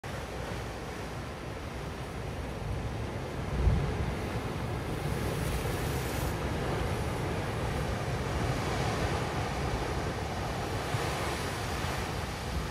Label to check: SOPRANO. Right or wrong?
Wrong